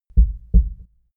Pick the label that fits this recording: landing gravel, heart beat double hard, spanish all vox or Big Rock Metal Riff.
heart beat double hard